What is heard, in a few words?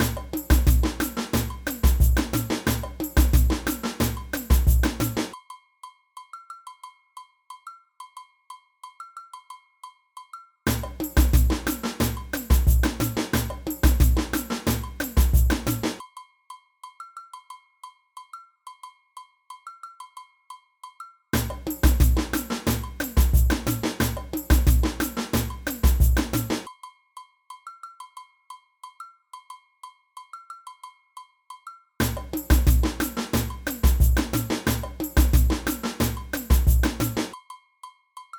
agogo, samba